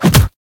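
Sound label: hit dull soft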